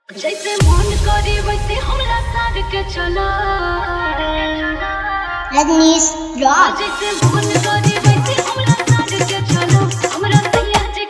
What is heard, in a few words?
Song Bhojpuri Remix Dj Hard Bass Mix